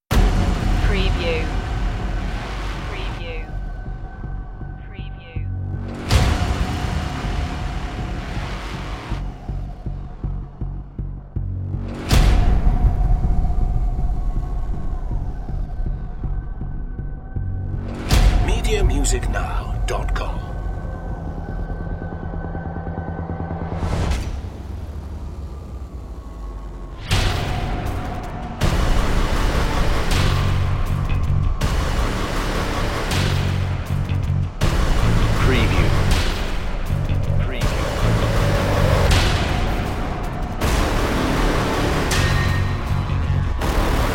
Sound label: blind violence no electric guitars